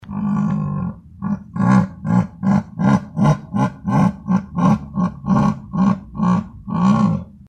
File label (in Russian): zvuk yaguara